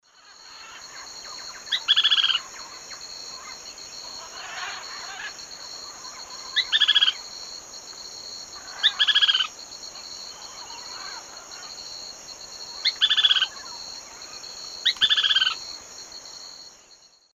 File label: Synallaxis albigularis, song; S. America; Eastern Lowlands